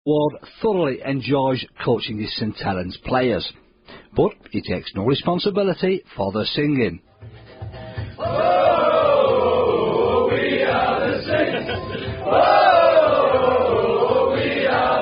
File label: Saints Singing, Crowd Chants; the saints are no 1